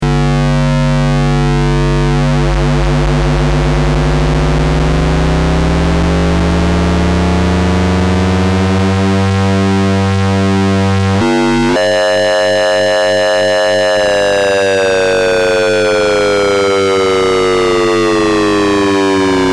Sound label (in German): Ringmodulator